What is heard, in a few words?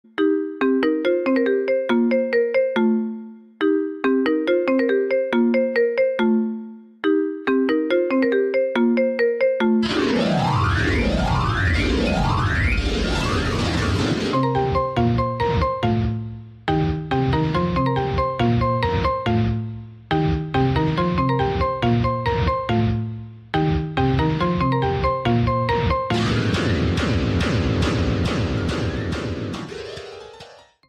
Dark MIDI iPHONE